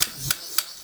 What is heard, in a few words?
servos plastic robot classic servo; Robotic Lifeforms 2; move